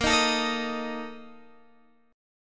Bb Major 7th Suspended 4th, Guitar, Open G; strummed; x,15,13,14,16,13; BbM7sus4

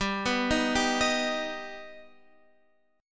B Augmented G

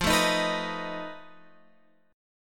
F 7th Flat 5th F down Guitar Open G

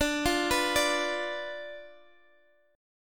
D Minor 6th Guitar Standard; Dm6; 22,20,21,19,x,x; arpeggiated; capo=2

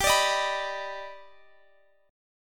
D sharp Augmented Major 7th

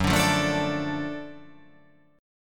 F sharp Minor Major 7th Sharp 5th

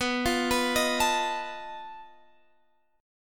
B 7th Flat 5th B Guitar Half Step Down

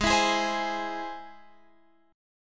G sharp Augmented G sharp Guitar Open F; G#+ strummed; x,15,16,15,15,15; JGuitar

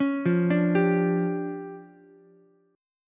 D Minor Major 11th, down, Ukulele, C6; DmM11 arpeggiated; JGuitar

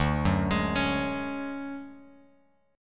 D-Major Sharp 11th, D, Bass Standard; arpeggiated; JGuitar

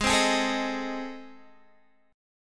A Minor Major 7th Flat 5th

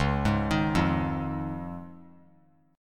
C sharp Major Add 9th; C#add9 arpeggiated; JGuitar

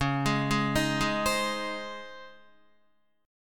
C sharp Major 7th Suspended 2nd C sharp, Guitar; arpeggiated; chord; 8,11,8,8,8,8

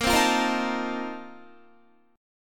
Bb Major 7th Suspended 2nd Suspended 4th, Guitar; strummed

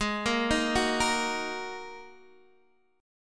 G sharp 7th Suspended 2nd G sharp Guitar DADGAD

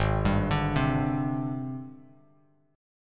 G sharp Major Sharp 11th, Bass Standard; G#M#11 arpeggiated; 4,10,12,5; JGuitar

